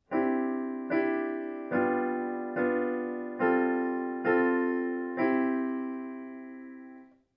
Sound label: added 2nd or 9th